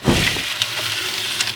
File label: Fx Drapes; Levels Hub Restaurant 01 Act Closet